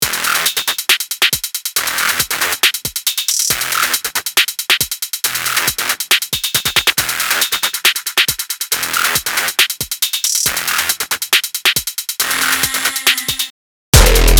electronic jungle renoise; weeklybeats 2024

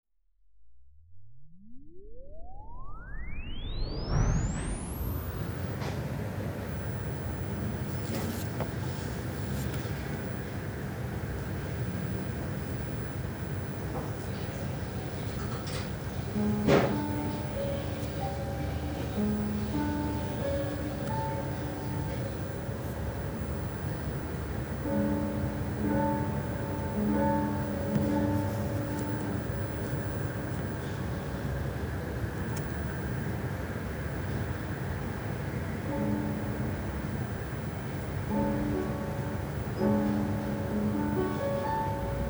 field recording stealth pianist recording; weeklybeats-2020

